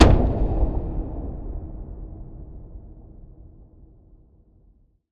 Impact Boom Thud; bottlerocket

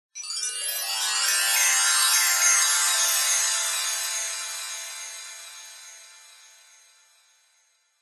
twinkling glistening glittery fairy dust sprinkle; zapsplat

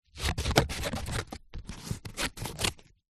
office scissors cutting card

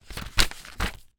paper notepad page turn; foley; zapsplat

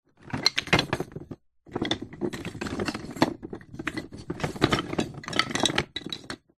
leisure toy wood bricks movement rummage; zapsplat

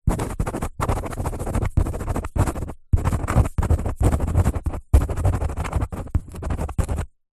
office pen ball point write fast cardboard sheet

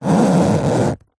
foley leather case thick rub squeak

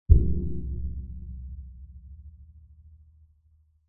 musical drum percussion metal gong like soft hit

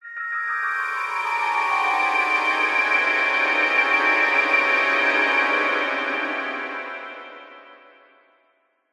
horror descending shrill nightmare; zapsplat

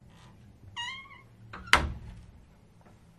cupboard door closes quiet squeaking; la paz; your free sounds

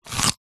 human bite carrot

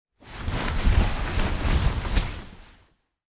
person in bed movements under covers; foley

